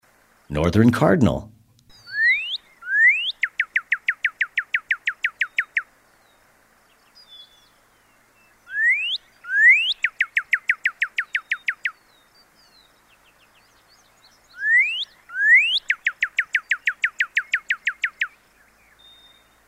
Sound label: backyard bird ID guide